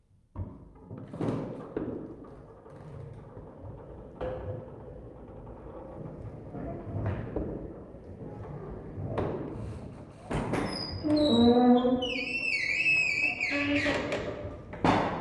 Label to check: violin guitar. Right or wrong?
Wrong